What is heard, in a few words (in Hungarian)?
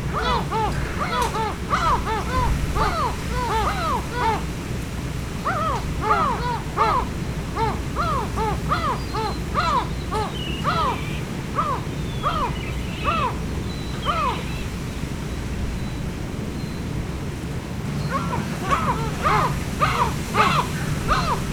sárgalábú sirály, halastavak; hortobagyi nemzeti park 2013